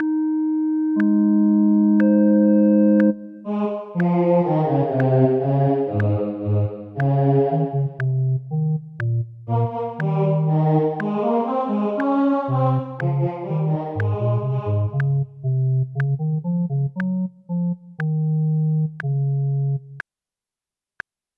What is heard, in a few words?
O clap your hands; Gibbons